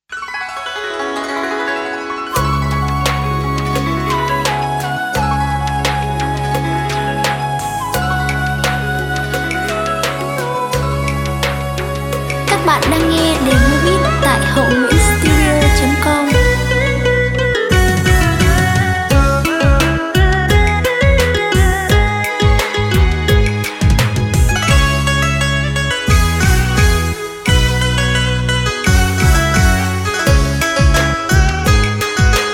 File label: beat, playback